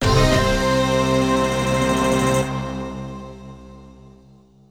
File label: Match End; war gods n64; Kabuki Jo